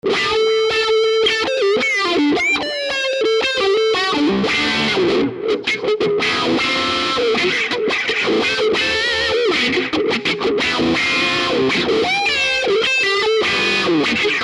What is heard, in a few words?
wah wah